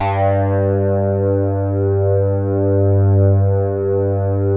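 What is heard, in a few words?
SOLID STATE, BASSES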